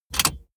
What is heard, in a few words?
car bmw e46 interior safety belt clasp inset hard